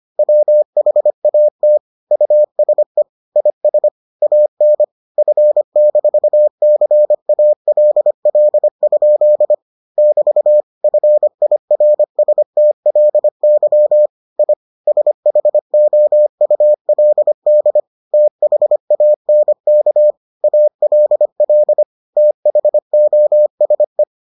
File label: morse